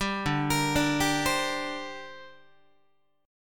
D 7th Suspended 4th Sharp 5th down Guitar; D7sus4#5 arpeggiated

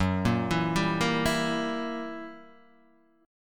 Gb 7th Flat 9th Gb Guitar Open D

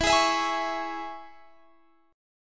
G sharp Suspended 4th Sharp 5th

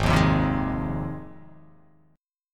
G sharp 6th G sharp down, chord

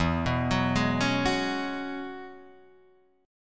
E 6th Add 9th E down Guitar Open D

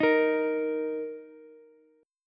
D sharp 5th D sharp, Ukulele; strummed; x,x,11,13; JGuitar

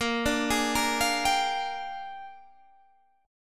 A sharp 13th, Guitar Standard; arpeggiated; JGuitar

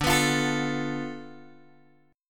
D Minor 6th Guitar Open G

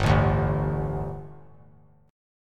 G Suspended 2nd G, down; Gsus2 strummed; JGuitar

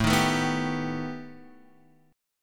B Augmented 7th A

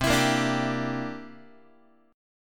C 9th, Guitar, chord; C9 strummed; JGuitar

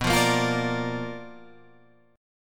B Minor Major 7th Flat 5th Guitar Drop D

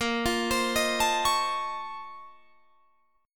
A sharp Minor Major 11th, Guitar, Drop D

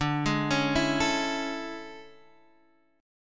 A Major 7th D; AM7/D Chord {X 5 6 6 5 5} arpeggiated

